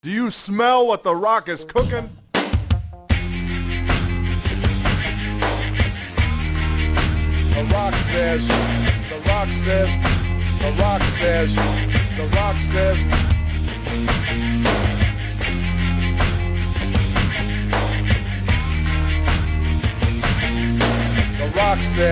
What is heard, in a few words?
rock